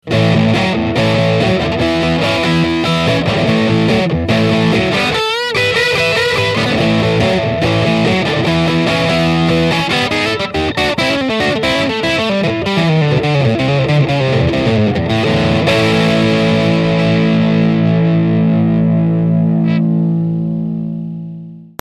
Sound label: Soft Drive Full Fulldrive